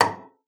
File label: FLAG A#5, LAUTE; Xsample VOL-8; LAUTE+FLG